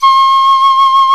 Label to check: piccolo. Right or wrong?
Right